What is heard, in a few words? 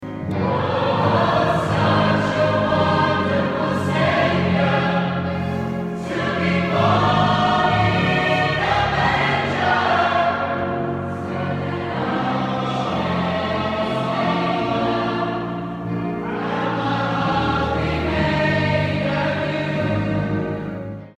Singing clips